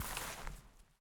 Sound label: step bush